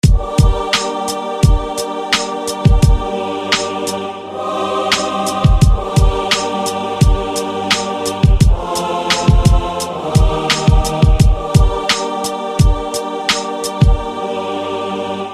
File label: Church Choir